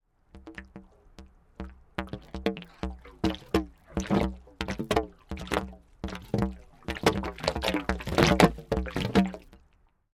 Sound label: Water Garden Hose Empty Gurgles Drain; Yard Tools